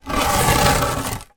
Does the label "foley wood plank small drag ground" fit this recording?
Yes